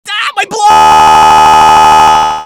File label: error; tf2; scout